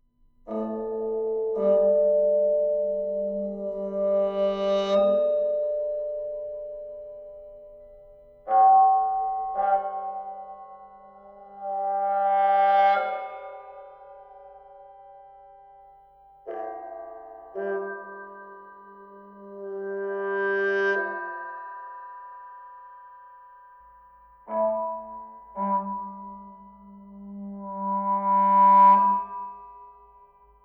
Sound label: objects Exceed Cymbal compare